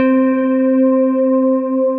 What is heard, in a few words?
Softchime, Synth Keys; Ableton; Factory Packs; Beat Tools